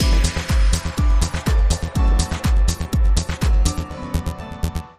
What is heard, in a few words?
Link Sting